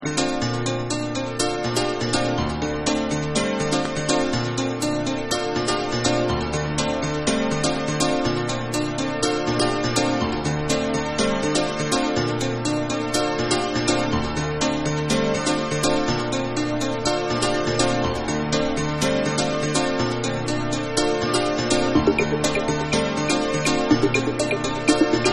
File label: Piano Mix